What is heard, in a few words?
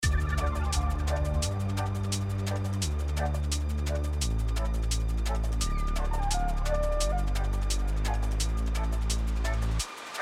Instrumental; Macca's